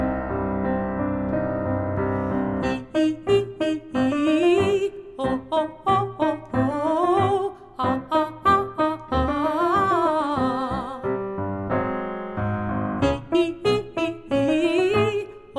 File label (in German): Warm up hoch; 05-Warm-up oooooooooüüüüüüüüüiiiiiiiiiiiii; Einsingen hohe Stimmen; Stimmbildung